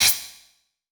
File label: Multimedia Internet CD-Rom Flash Click Multiple